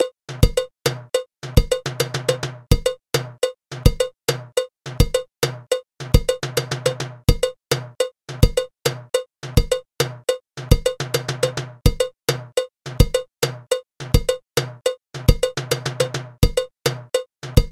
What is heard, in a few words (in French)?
merensongo batterie